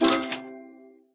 Market Buy; Farm Mania

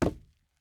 wood land

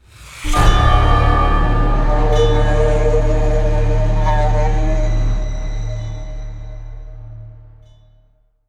Round End; Zombie Stalingrad

